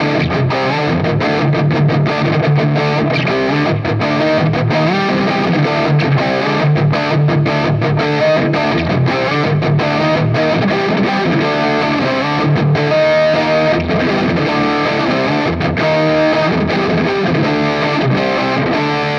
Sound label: Nux MG-30 Mesa Boogie Dual Rectifier